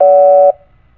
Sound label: WARNING; planecr